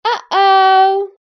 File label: incorrect; multipleChoice